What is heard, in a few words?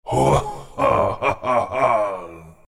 laugh, zh; Dota 2